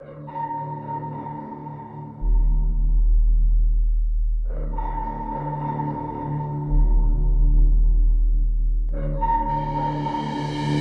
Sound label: Aftershock (Orch)